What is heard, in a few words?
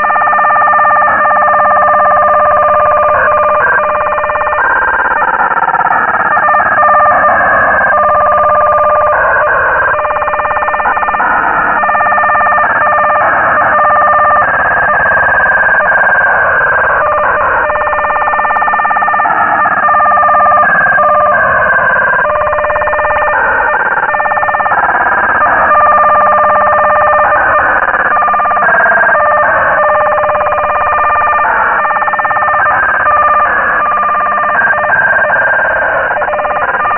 data transmission; 5124point5